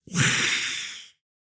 Skeleton Archer Attack Vocals

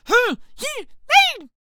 Death Vocals

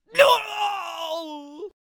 Bomb on a Stick Death Vocals

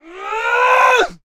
Pike Attack Vocals

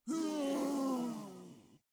Death Vocals; Dark Peasant

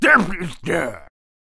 Knight Death Vocals

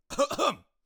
Teacher Attack Vocals

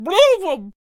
Potionseller Attack Vocals

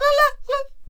Bomb Cannon Attack Vocals

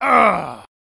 Knight Death Vocals

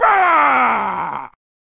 Knight Attack Vocals

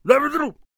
Attack Vocals; Halberd

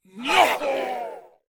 Dark Peasant Attack Vocals

